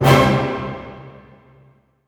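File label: C major fortissimo, orchestra hits; Sound On Sound - Peter Siedleczek's Orchestra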